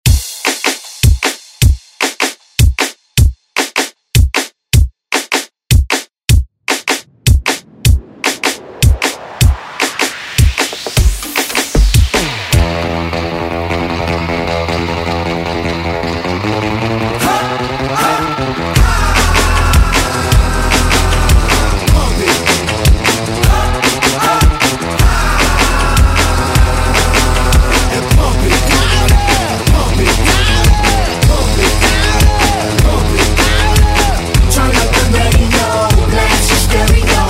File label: ReDrum; DJ Mhark